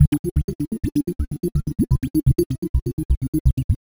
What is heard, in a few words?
tweet show D 126, synth loop; Synth Loops; Zero-G; Soundclash Nu Dance Electro; Acidized; 126bpm